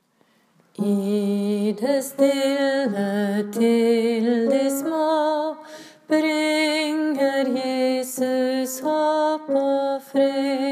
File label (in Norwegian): i en natt alt; åfjord